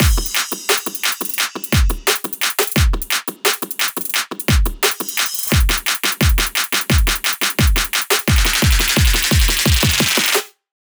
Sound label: Trap Buildup Drums 174 BPM; Loops; Cymatics; EDM Starter Pack